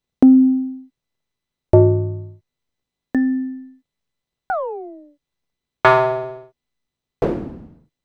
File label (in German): Behringer Syncussion SY 1 OSC Mode A bis E